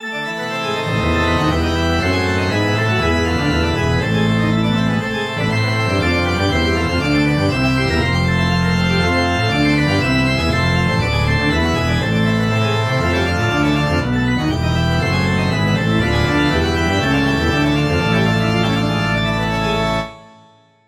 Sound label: organ